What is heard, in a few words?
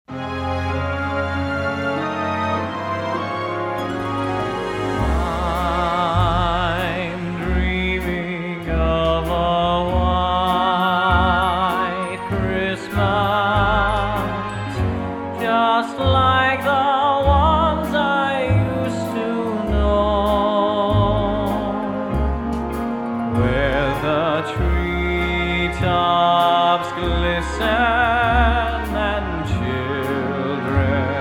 w Vocal